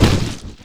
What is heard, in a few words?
Brick Block; New Super Mario Bros. Wii